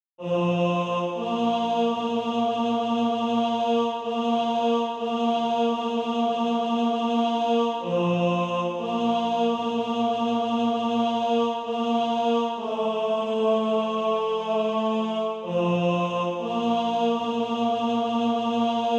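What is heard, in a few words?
tenor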